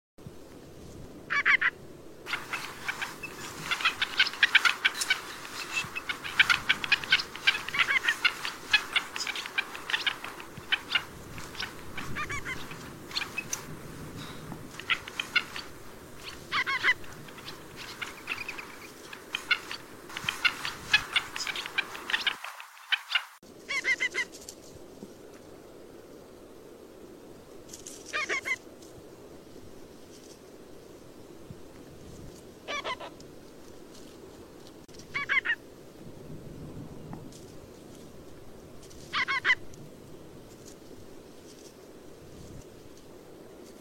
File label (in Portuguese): Canto do combatente